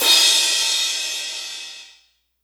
Cymbal Crash OS; Foundry (Sony Creative Software); Rondo Brothers Trip-Hop Loops & Samples; Hard Rocks The Roof

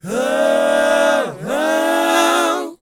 BOYZ A, Vocal Planet R&B & Dance; ILIO

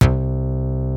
SYN BASS, Peavey DPM; Rubber Chicken Software Sample Bank

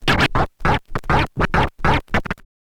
scratch kit, vinyl

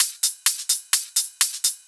Hihat Loop 128 BPM

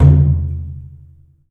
DRUM; The Piano; InVision Interactive

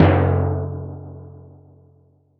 Timpani, Percussion

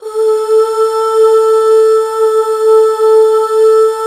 HAL UUHH, HAL Back Uuh Fem

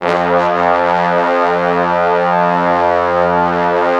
F1, Orchestral Brass; Best Service ProSamples vol.20 - Orchestral Brass; AKAI

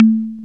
FM Perc; Rap

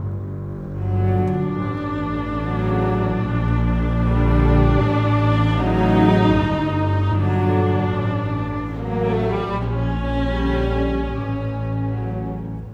Cinematic Strings, Orchestral

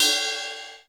ride; Crypton - DrumBase5000

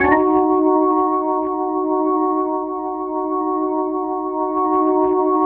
ORGN 123, Keys; Houseworx

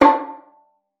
VIOLIN

